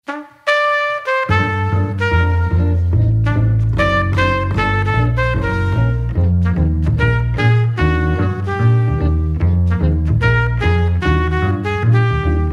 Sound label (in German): Gitarre Bass Trompete; Coda Club